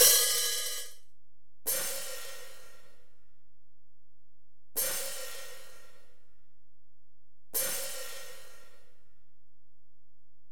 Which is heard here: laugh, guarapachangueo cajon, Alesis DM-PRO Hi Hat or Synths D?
Alesis DM-PRO Hi Hat